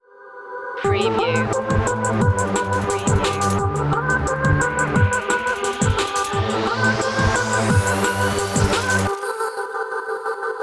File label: digital siren